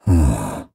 Nonverbal Think; Ornn

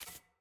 UI Log Hover

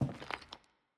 Footstep Plates

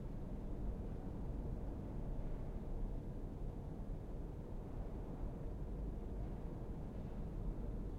Ambience Mural

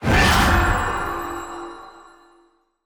Enemy Boss Dark Binding Cast; bloom minigame

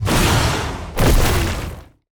Enemy Attack Throw Prep Rock; bloom minigame

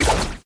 Attack Dash; bloom minigame

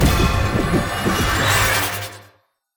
minigame SFX Banner; Assist Zed; bloom minigame

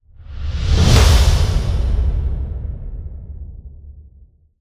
Boss Stinger

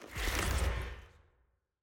UI Log Appear

